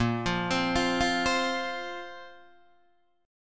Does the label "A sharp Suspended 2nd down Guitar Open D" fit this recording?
Yes